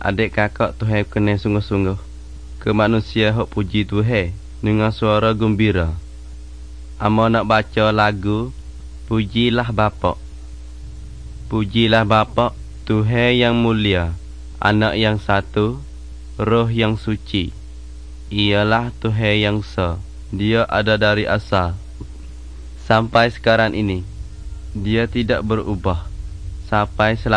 songs malay pattani

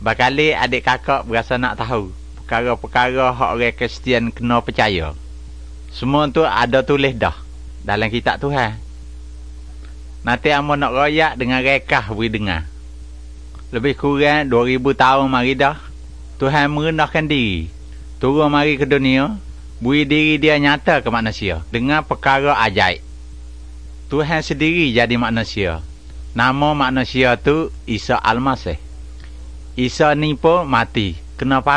songs malay pattani

